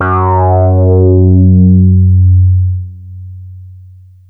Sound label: FUNKY, BASSES; Masterbits - Vintage Voltage; VV BASSES; FUNKY PAVE